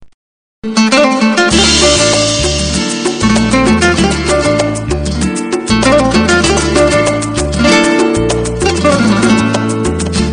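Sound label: best guitar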